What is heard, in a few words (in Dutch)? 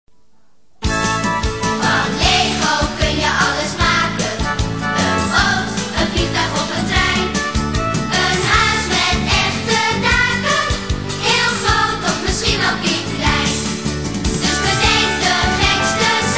lego lied